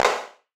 clap low

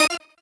normal hit normal

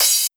cym

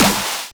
big clap snare

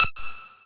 lead

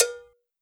normal hit clap

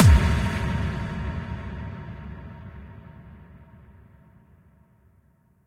reverb kick

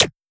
tick

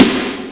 Snare Long Slam

